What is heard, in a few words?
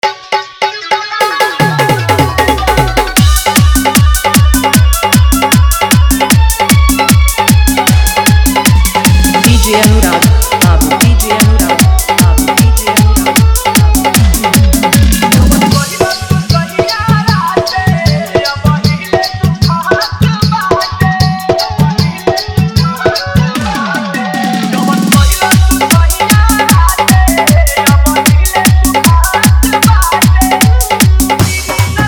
Full Dhollki Bass Rod Show Garda Dance Mix